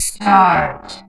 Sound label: VOX VOX, Electro Vox; Sound & Vision; Gigapack II CD 2 (Roland)